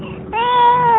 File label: crying, baby cry